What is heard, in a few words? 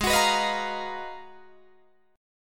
Ab Minor 13th, Ab, Guitar, Drop D